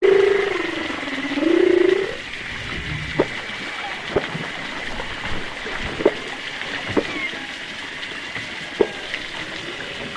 whales humpback underwater distant east australia choppy water; water movement; zapsplat